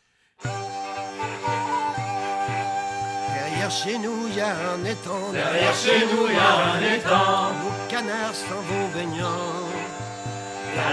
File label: Tavern; Assassin's Creed Unity